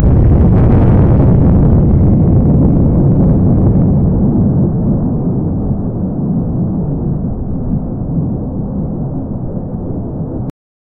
a deep rumbling thunderstorm rolling across the sky